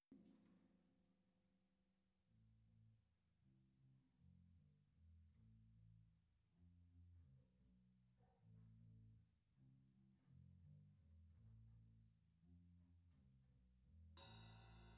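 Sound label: PZM Omni stereo Drums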